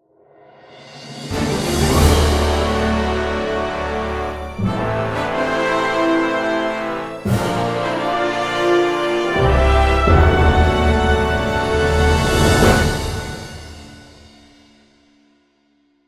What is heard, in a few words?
Victory, Music; Boss Room; HighGroundRoyaleNetcode